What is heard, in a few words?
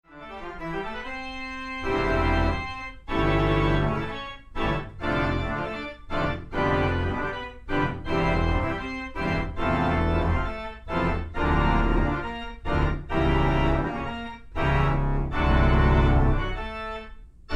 Organ Fugue, Baker House; Unaltered